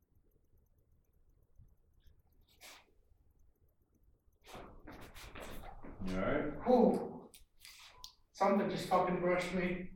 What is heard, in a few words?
EVP; poasttown elementary school investigation; Mean Teacher Room